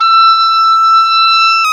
OBOE, mf f; Roland L-CDX-03 Disk 1; Oboe 2&3

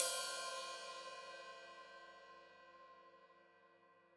Hat Open, Hi-Hat; Deeply Sampled Drums

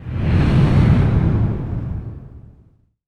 IMP FLAME, Cinematic Impact; M-Audio Pro Sessions; Liquid Cinema

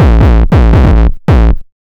DR 909 140, Hard & Loud Techno; USB Soundscan vol.01; AKAI

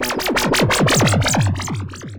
Zapps, sci-fi

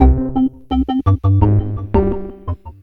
Bass, Futurist Drum & Bass

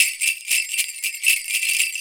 DRM

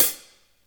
HAT

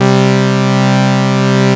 DANCY C3, BASS; Q Up Arts; Dream Experience

